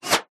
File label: bullet wizz by left centre whine into slice; Skyclad Sound